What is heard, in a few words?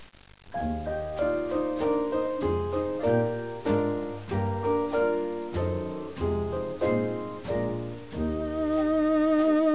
Waltz